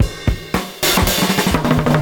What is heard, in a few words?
CYMB, Breakbeats; USB Soundscan vol.46; AKAI